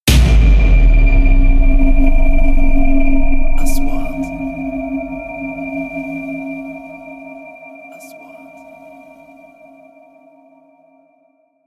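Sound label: 3D SFX Trailer; مؤثرات تشويقية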